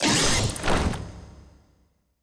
cutholoids attack; Stellaris; grand archive; ships

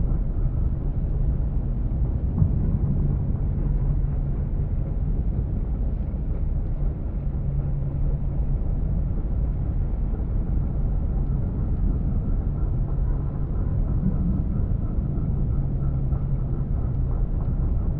ship battleship idle; aquatics

